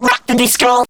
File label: Vocal Phrases; Vengeance Samples; Vocals